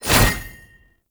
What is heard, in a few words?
gunbrella aim transition out; Weapons; Warframe; Tenno; Koumei